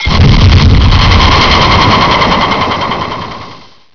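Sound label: rocket explosion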